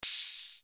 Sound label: Hats, Roland T6; DRUM MACHINES